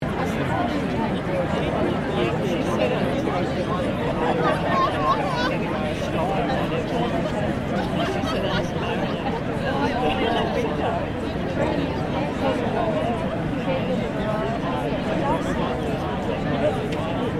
Crowd Large Outdoor Event Chatter, Outdoor event crowd; Crowds